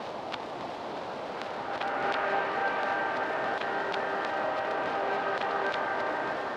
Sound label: scratch; Rumbles